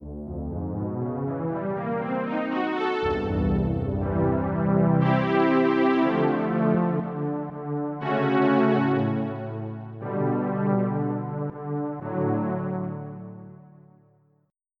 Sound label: Synth String; FF7